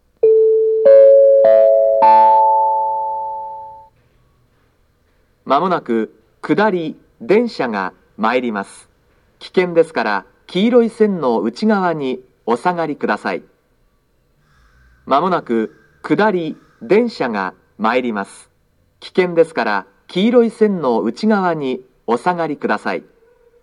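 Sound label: YRP Nobi approach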